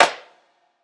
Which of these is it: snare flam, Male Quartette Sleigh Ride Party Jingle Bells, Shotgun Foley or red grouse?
snare flam